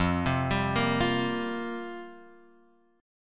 F Suspended 2nd Suspended 4th Guitar Open F, Fsus2sus4 chord; arpeggiated; x,0,0,2,1,0; JGuitar